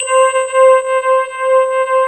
BELL BRASS

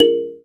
KALIMBA; Spectrasonics; Heart of Africa; KALIMBAS

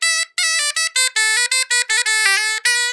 BOMBAR, Celtic Flavours; USB Soundscan vol.42; AKAI

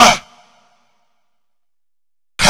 Huhh Vox; Sonic Foundry (Sony Creative Software); Club Joints and Street Anthems; Toxic Rain

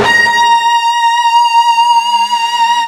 FUZZ A#4, ULTRA FUZZ, Guitar; East West; Steve Stevens Guitar Samples Collection

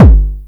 909 HARDKICK; Giga Samples Collection; 909 Clubkit; Bass

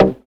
Synths Root C

